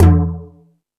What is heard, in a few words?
More Toms, Drum Machines